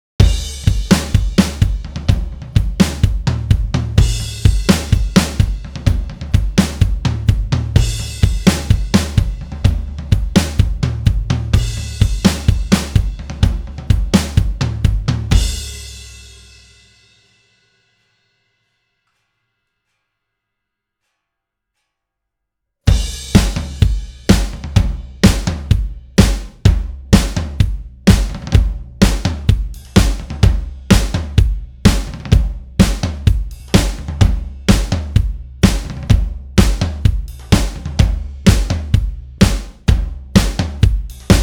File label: drums